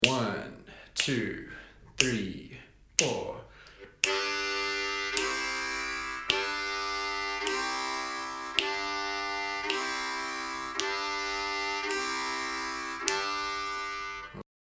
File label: Solo Rhythmic Harmonica Playing